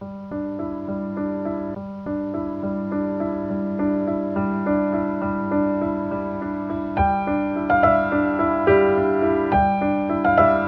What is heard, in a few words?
piano, Loops Sad